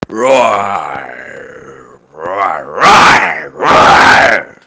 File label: roar arab